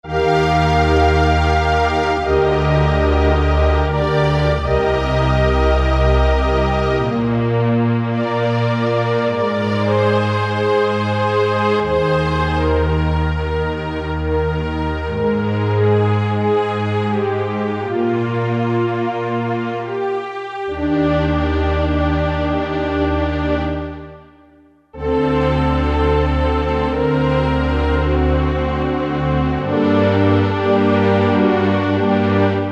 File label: New Strings, Korg MSC-4S Orchestra; Korg MSC-4S Orchestra 1